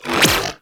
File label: Split Laser Retract, Weapons; Warframe; Corpus